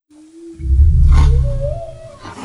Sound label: grumble